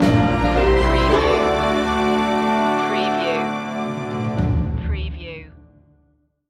country life stinger

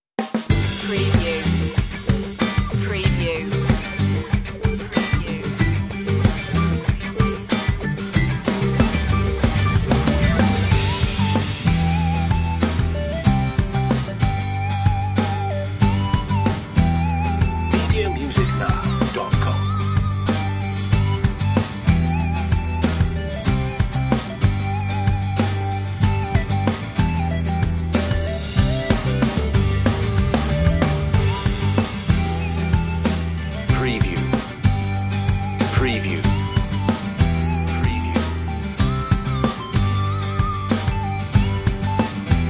crash synth

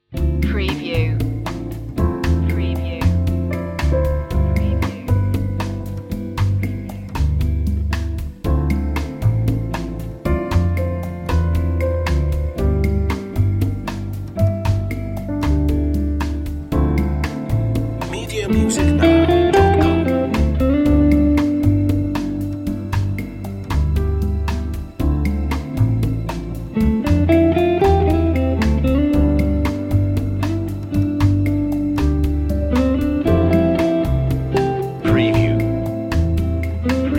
moody bossa

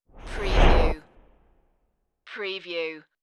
Whoosh

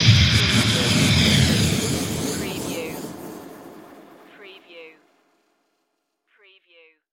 Magical Blast Swirl Trails

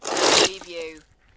Snow and Ice, Ice or snow scrape with debris

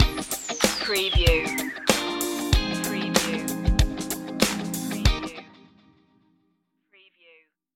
forever funk stinger

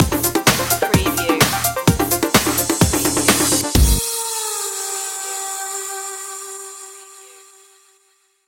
club breakz stinger

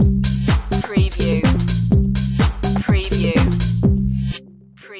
organic house

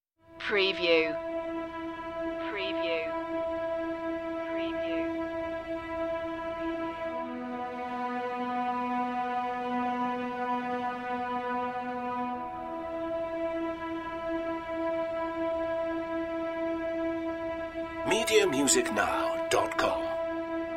no harp

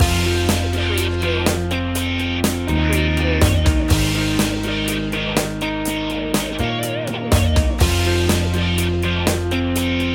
loop, chill

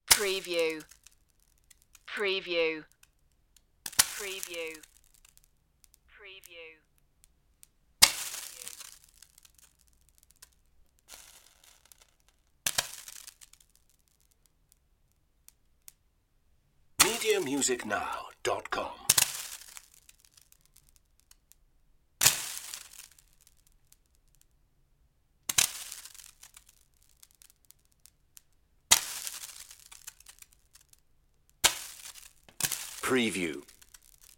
water shock evaporating

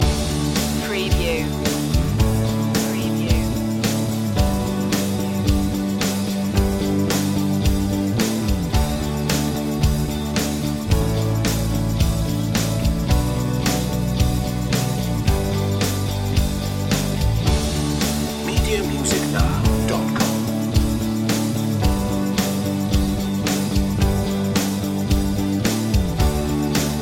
pop celebration loop

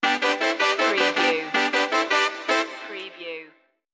happy ska town stinger

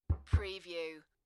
Tapping on the Bottom of a Plastic Bucket; beanstalk audio

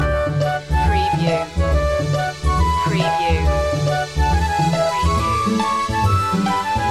the squirrel loop

